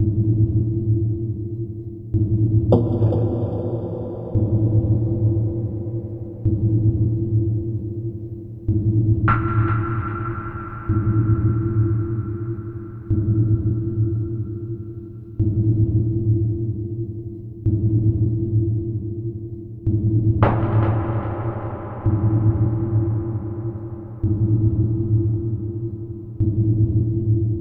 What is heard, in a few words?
Night Stalker, horror surreal